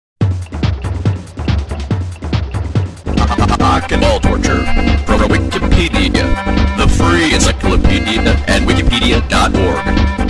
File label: music